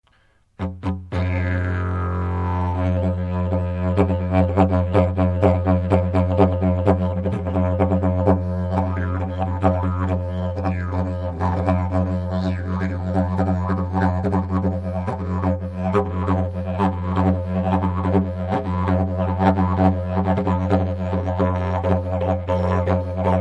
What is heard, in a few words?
yidaki